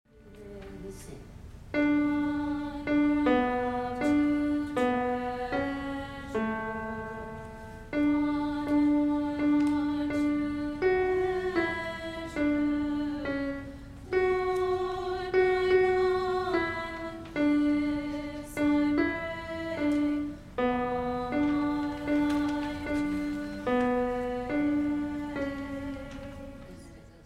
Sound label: alto